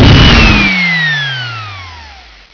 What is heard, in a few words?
endfire; weapons